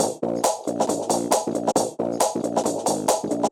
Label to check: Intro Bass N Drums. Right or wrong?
Right